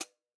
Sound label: FOOT HI HAT, drum; Sonimus; s series drum pack; STONEQ 4K; SATSON; SATSON CS